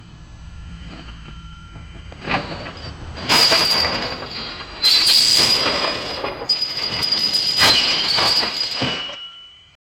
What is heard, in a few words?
sound of a colossal ships, nautical